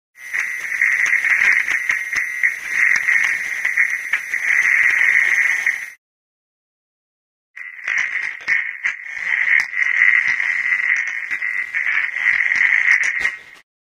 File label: computer noise; radio signals